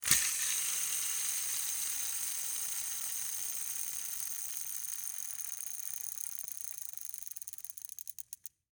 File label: Roller skates, old fashioned, all metal, wooden wheels, swipe, spinning, slow to stop, aggressive; Eiravaein Works; Vaeyan IV